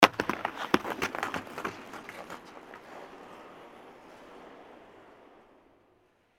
players jump off bench

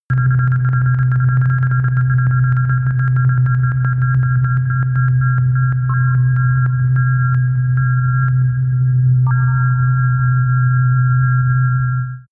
space signal loss

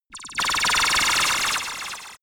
spacy fragmentation granade

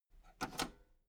metal lever button radio enable slow

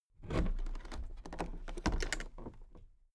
toolbox open soft

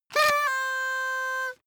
paper hoot

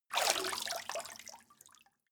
step water run out

